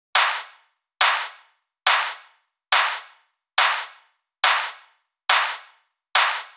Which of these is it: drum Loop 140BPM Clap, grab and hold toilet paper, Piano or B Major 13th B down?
drum Loop 140BPM Clap